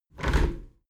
window handle squeak turn close halfway heavy; objekte; fenster

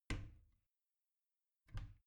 bathroom cabinet creak open regular; objekte; tueren

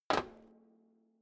step boots metal rattle single stair up; schritte

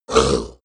ogre hit; kreaturen

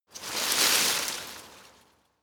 scrub small branches hit; haus-garten

